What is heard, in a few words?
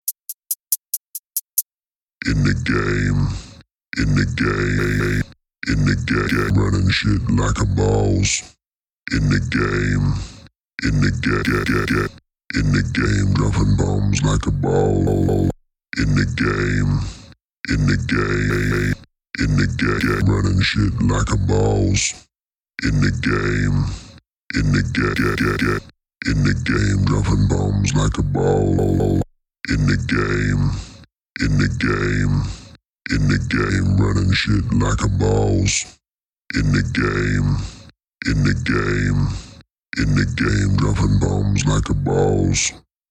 Trap Acapella; Fo Rizzle In The Game